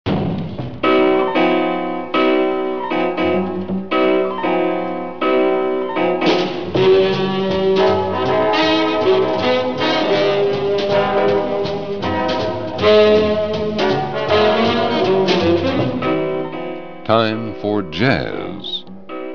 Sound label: jazz hour intro; VOA